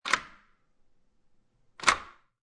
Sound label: Lock And Unlock Padlock, Household, Lock And Unlock Padlock, Turn Lock With Hand, Inside Perspective